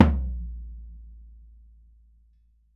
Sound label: BOMBO, Brazil Drum Perc; E-MU Producer Series Vol. 7 – Old World Instruments